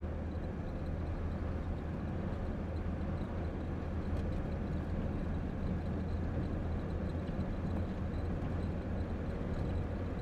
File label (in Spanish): AUTO TOYOTA CORONA INTERIOR EN MARCHA